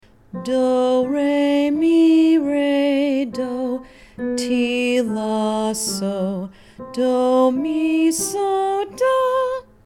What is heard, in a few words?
Centering Song